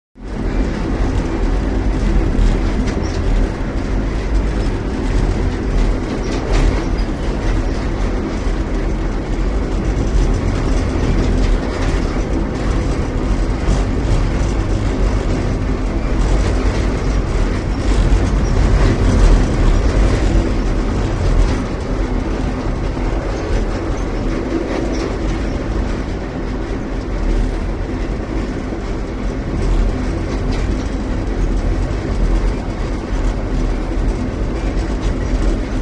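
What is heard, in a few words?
Diesel Train Interior Steady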